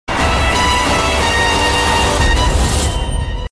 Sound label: sms ringtone; raees